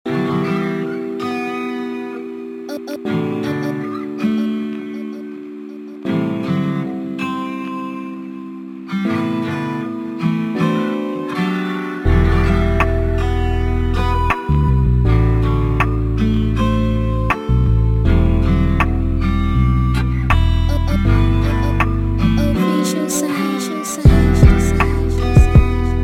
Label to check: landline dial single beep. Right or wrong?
Wrong